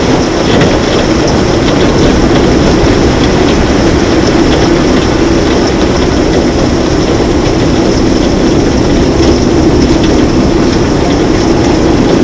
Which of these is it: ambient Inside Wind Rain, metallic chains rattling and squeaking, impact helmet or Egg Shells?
ambient Inside Wind Rain